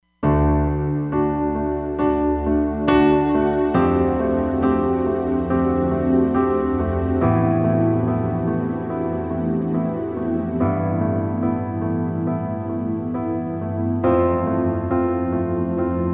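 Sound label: Warm Pad Piano, Roland Fantom XR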